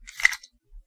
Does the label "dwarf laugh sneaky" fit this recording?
No